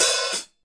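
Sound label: solid open hi hat to closed hi hat; drums; single hits; hats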